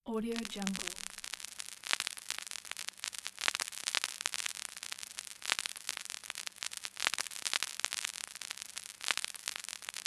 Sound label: vinyl noise; fx-library